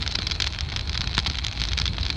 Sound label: Camp Fire